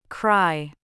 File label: cry